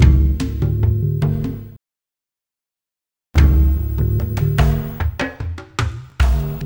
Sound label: Fire Loop, Percussion Loops